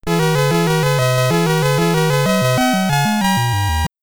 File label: Round Clear (SID Stereo); Wonderboy in Monsterland Commodore 64